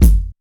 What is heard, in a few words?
dry bass drum sample g key, bass drum tuned to the G note